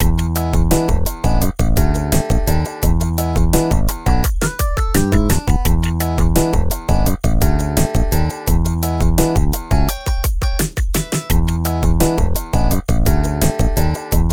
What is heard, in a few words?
Funky Loop